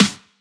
good snare one shot g sharp key; snare drum samples; one shots

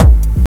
Panned Hard Techno Kick; single hits one shots; kick drum samples

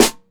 short steel snare drum sound A#; Duration 0:01

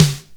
natural snare sample D key

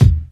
tight bass drum sample D# key; Duration 0:01; Channels Mono; kick drum samples

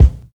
dry steel kick drum a sharp key; kick drum samples

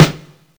reverb snare one shot e key; snare drum samples

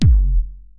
solid kick drum f sharp key; kick drum samples

